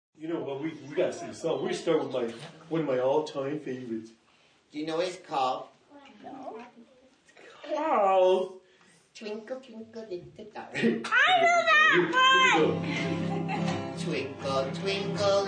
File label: Clown Performs, Clown performing for a large group of young children and they laugh hysterically; Crowd Sound Effects; giggling, yelling, kids, males, females, boys, girls